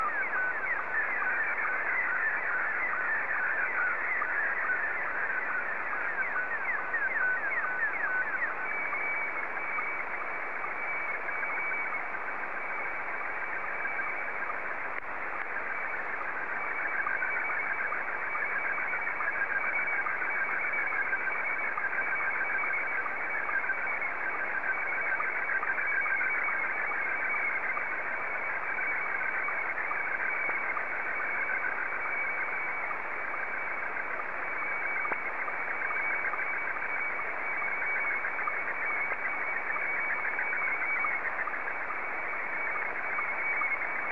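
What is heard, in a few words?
SSTV, Scottie 2; JA3QJQ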